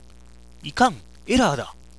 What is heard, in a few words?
error